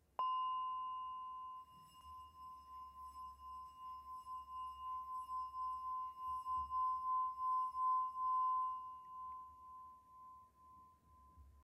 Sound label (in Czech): tibetska misa zdobena